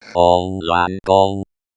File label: hongluan gong